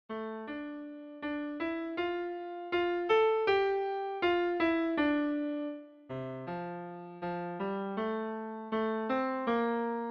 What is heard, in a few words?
midi